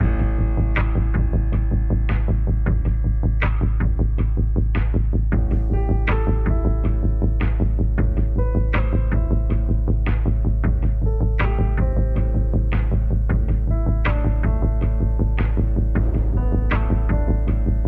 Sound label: HQ Safe House Rough Loop; Clandestine